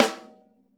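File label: RAW snare drum, Drums Acoustic; AKAI; 90s sample CD; USB Soundscan vol.10; RAW KIT 6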